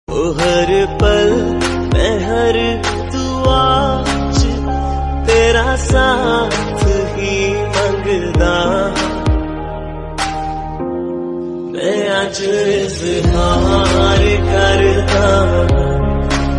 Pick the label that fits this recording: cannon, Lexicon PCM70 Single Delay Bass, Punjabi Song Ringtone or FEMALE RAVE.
Punjabi Song Ringtone